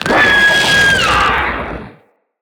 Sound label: Spider Vocal Death; Vocals; Root Spider Mother